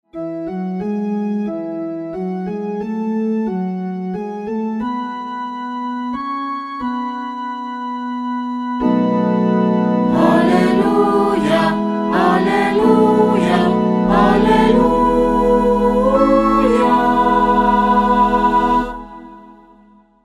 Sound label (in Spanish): Aleluya